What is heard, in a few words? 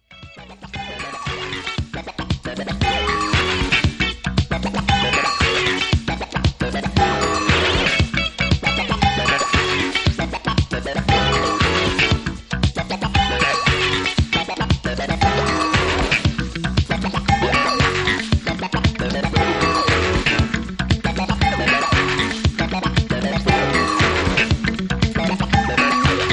Instrumental